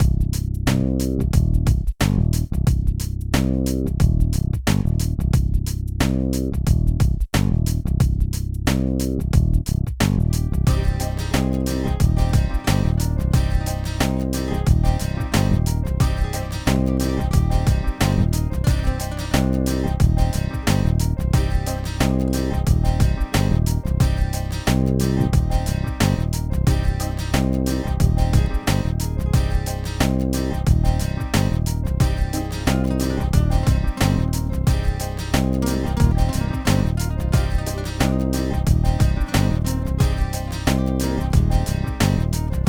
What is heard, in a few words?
Corporate